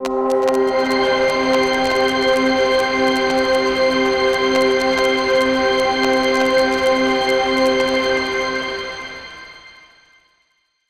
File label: Granular and Regular Engines; SonuScore Nordic Spheres